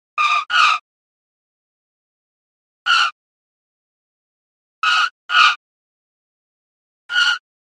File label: Tire Skids For Plane Landing, Four Options.